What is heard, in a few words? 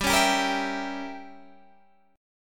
E Diminished 7th G, guitar